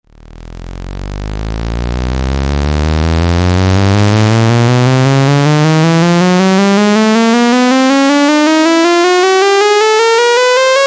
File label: quant, modular